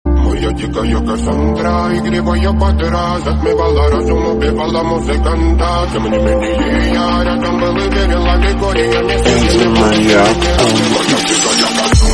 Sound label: famous background music song tone ringtone